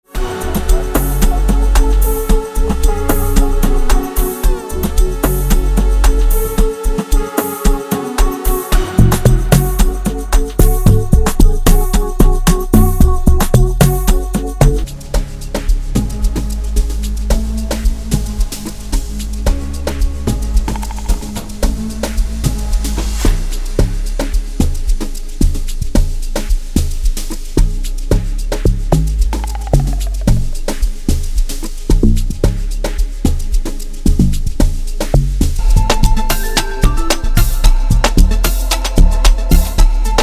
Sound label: Amapiano; Amapiano Hits